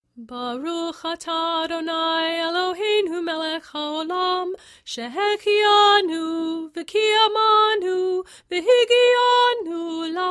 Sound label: Shehechianu Chanted; Cantor; concluding service